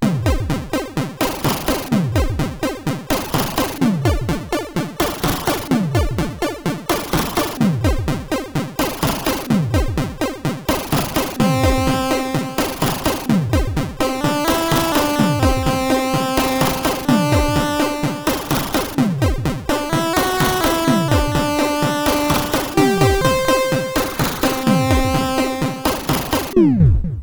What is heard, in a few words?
Rap, sid CSG8580R5